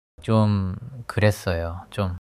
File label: struggle